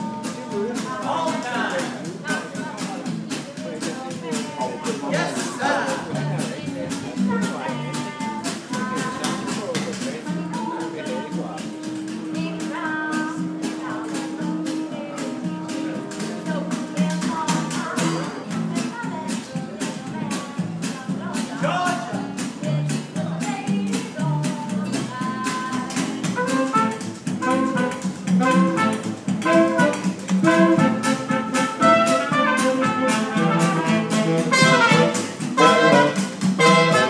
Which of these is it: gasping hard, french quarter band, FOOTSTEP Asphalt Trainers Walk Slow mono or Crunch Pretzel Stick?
french quarter band